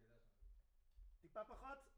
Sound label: Room